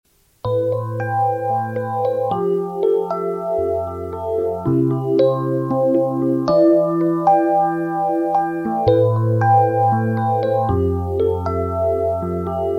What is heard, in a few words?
EP